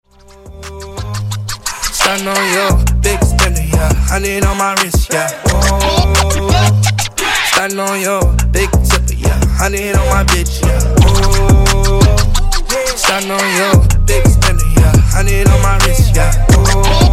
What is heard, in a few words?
Scratch Dj Intro Outro 87 Bpm